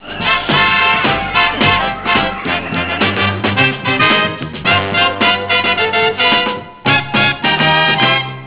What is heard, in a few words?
funk sting; bradybunch